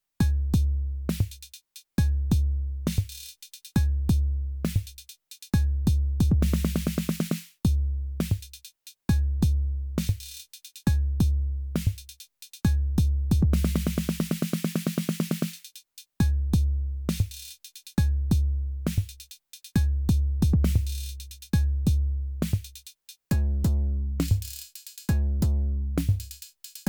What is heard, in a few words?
drumbrute impact